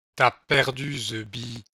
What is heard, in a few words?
gameover